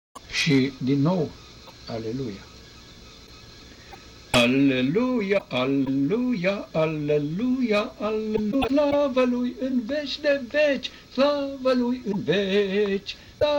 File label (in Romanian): Aleluia, Aleluia, Aleluia, Aleluia